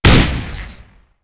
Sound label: explode; g-toilet nextbot; GToilet SKIB FANMADE